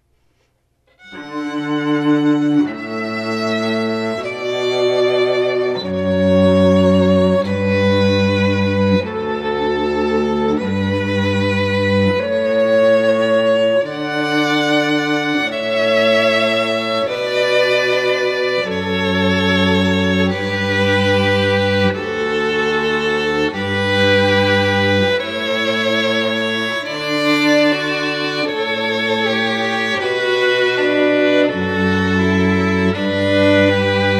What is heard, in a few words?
strings canon